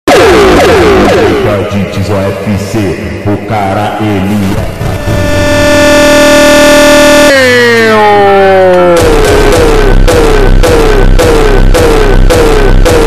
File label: Slowed remix